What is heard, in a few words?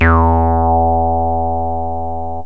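bl square, yamaha rm1x synth bass and lead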